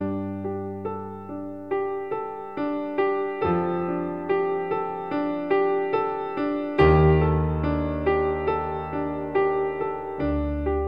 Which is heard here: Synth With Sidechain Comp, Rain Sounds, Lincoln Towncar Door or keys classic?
keys classic